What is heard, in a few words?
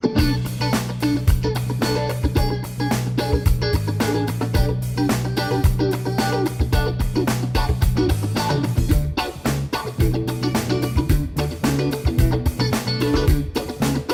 High Pass Filter setting; electro-harmonix nano q-tron